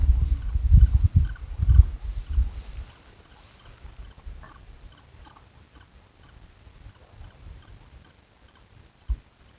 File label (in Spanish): Guayata